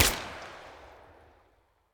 m4 fire silenced loop tail; Escape from Tarkov

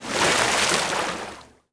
zodiac splash big rear; veh zodiac splash big rear; Modern Warfare

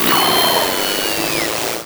drone panic

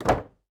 door small wood close end; Rust

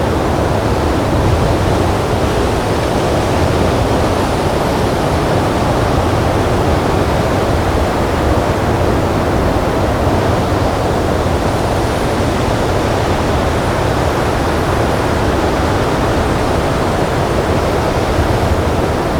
Ambient Wind; DCS

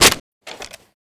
m4 reload clip in; foley; Call of Duty Modern Warfare

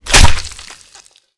turnbuckle stab stereo

